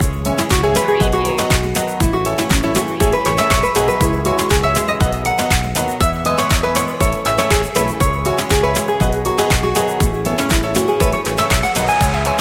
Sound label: guitar loop; media music now